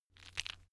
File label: stone gravel clatter soft; natur-tiere; steine